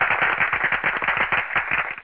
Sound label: plause small